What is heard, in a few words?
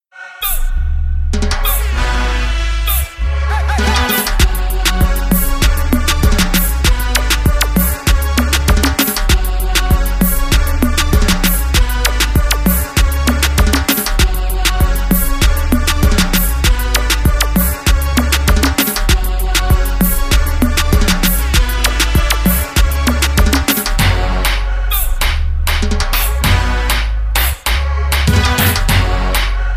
newragga